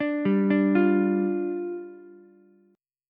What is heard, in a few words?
D Minor Double Flat 5th, down, Ukulele; Dmbb5; arpeggiated; JGuitar